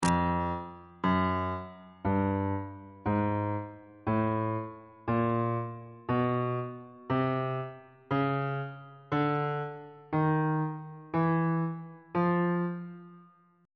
f dorian mode chromatic scale 1 octave on bass clef